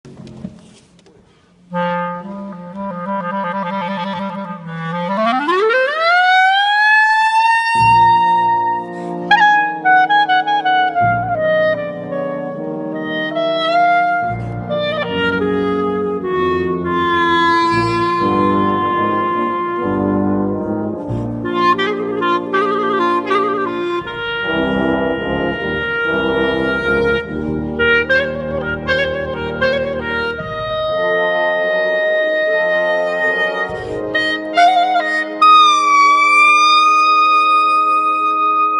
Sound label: Clarinet